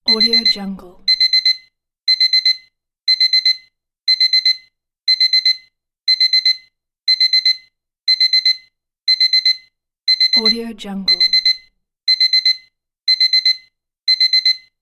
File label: digital timer alarm